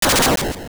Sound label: Dungeon Spinner; A dungeon path-spinner; Zelda Oracle